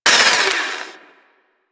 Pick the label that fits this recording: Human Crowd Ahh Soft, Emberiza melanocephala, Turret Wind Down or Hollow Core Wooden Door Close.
Turret Wind Down